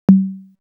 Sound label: Tom, Drum One Shots; AMG - Coldcut's Kleptomania VOL-1